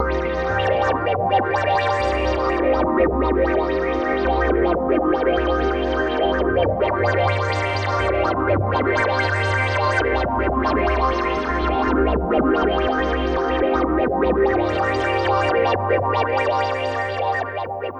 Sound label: Sweeper Modulation Effect Filter Modulated; Reason Studios; Reason 11